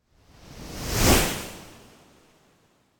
Wave By Medium, Fast And Thin Wave - Passing L to R Wave Whoosh; Hollywood Edge; sound designer's toolkit v2